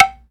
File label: Bamboo